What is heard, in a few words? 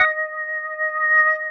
ORGN 123, Keys; Houseworx